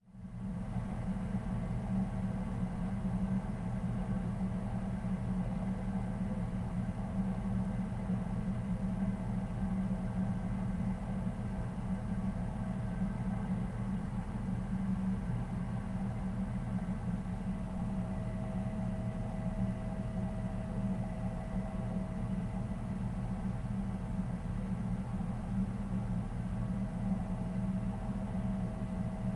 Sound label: indoor basement neutral sound; Sonniss GDC